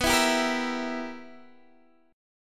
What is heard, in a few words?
B Major 7th Suspended 4th Sharp 5th Guitar Standard, chord; BM7sus4#5; strummed; x,14,14,12,11,12